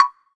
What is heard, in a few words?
WOODBLOCK; EdgeSounds; Drum Mashines; R-8 DRUMS